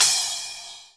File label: splash, virtual drum sounds